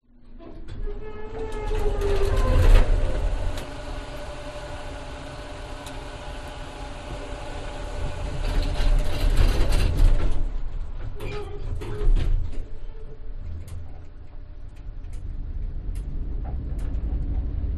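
industrial elevator doors close ride open binaural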